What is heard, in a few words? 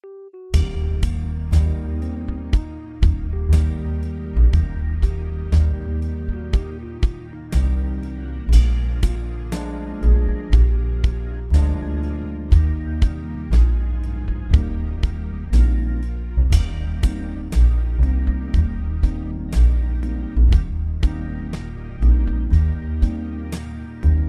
down by the riverside, trumpet